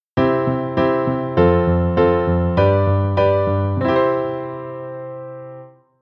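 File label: kadenz piano perfect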